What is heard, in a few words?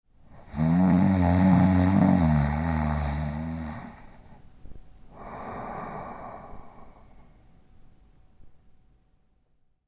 Snoring Male Snore; Snoring Sound Effects; human sound effects